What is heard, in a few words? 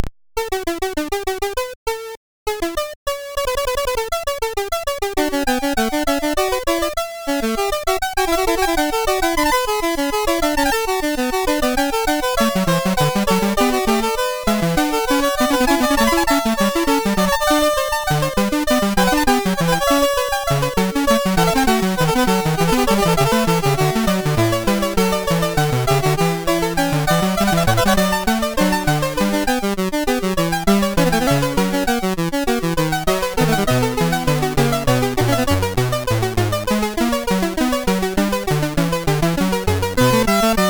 fugue no. 7; SID